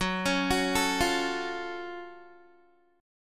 Gb Minor Major 7th Gb, down, Guitar; GbmM7 arpeggiated; 4E,4A,5D,5G,5C,6F

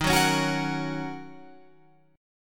D sharp Minor 7th, Guitar, Open F; strummed; JGuitar

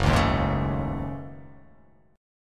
F sharp Minor 6th Add 9th

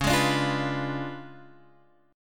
Db 7th Flat 9th Db down; Db7b9 strummed; JGuitar

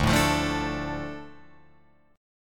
D Minor D Guitar Open F; Dm strummed

